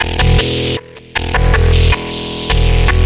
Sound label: Hott Fuzz, Synths; Sonic Foundry (Sony Creative Software); Modular Electronica